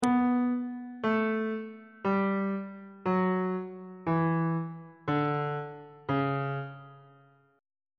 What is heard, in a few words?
c sharp locrian mode descending; bass clef